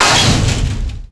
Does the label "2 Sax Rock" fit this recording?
No